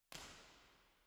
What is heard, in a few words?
First IR Sala 2800 Dodec Center BIN, Binaural; Santa Cecilia Roma; Measurements 2005; Soundfield